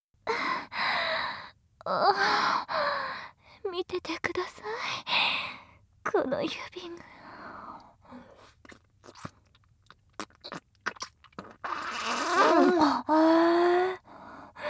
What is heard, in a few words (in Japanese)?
ボーナス 淫乱オナニープレイ